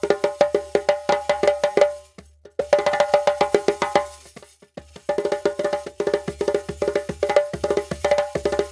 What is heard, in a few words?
Djembe with Kessing, African; Sonic Foundry (Sony Creative Software); Continental Drift Aftershocks